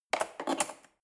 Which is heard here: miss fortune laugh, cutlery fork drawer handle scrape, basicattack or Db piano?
cutlery fork drawer handle scrape